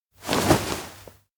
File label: bow head; rikka; anemoi